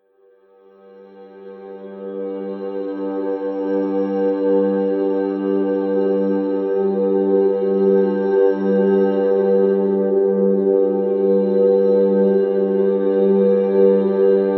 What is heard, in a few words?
Pad Space Violin G3